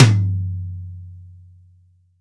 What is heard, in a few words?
acoustic tom g key; tom drum samples